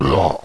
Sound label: pain, zombie